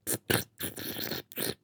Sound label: glue slurp